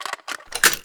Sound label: gui picklock open; Neverwinter